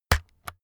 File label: keyboard external key hit slow